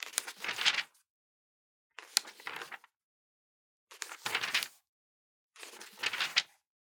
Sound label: Organic Paper Page Turn; boom library; The Interface